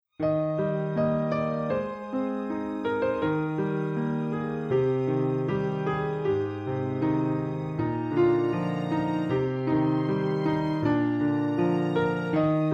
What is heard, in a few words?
full orchestra